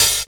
ohh, hihats; house; PS-28 Vocal House Samples; Drum & Perc

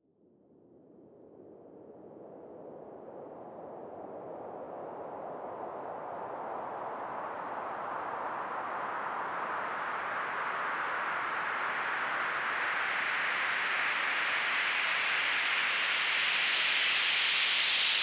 SweepFx 140BPM; D minor; Sound FX; Vengeance Samples

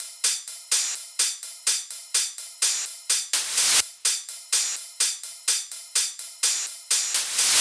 Kit 12, 126BPM; Purple Kit; Vengeance Samples

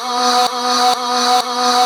House Loops, Vocal Loops House 128BPM

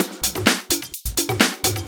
Percussive Loops 128BPM; Vengeance Samples

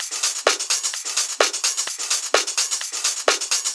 House Loop, 128 BPM; Vengeance Samples

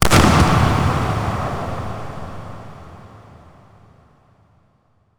Bassdrums FX, FX Kicks; Vengeance Samples

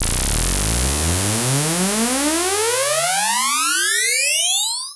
Lazers

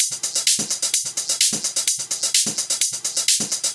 Minimal Loops

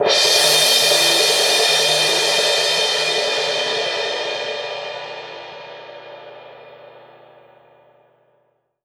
Cymbals Crash; Vengeance essential club sounds-3

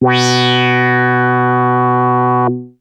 Arp A B2, ARP Odyssey synth; musicradar-synth-samples

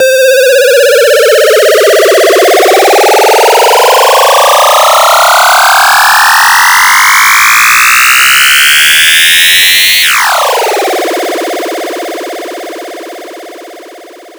Sweeps Long

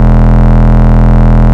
Root E, Bass

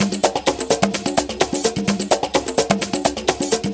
Latin House Loop 128 BPM; Vengeance Samples

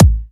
Bassdrum; Vengeance Samples

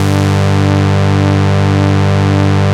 5th Saw G1, Moog Voyager; Moog Voyager Sounds; VES1 5Th Saw

